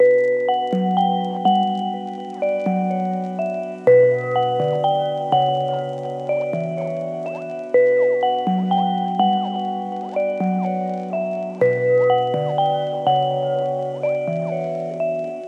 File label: noisy bells; Melody Loops; TRUTH-HZ; Crowdsourced Sample Pack